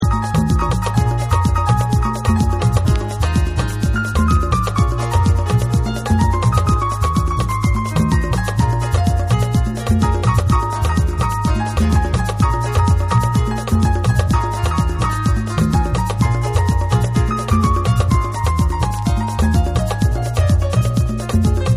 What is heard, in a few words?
Tribal Winds Instrumental Groove